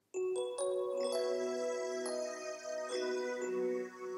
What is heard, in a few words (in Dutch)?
Muziekfragment Nederlandstalig